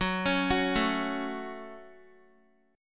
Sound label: Gb Minor Guitar 4E,4A,5D,5G,5C,6F; arpeggiated; JGuitar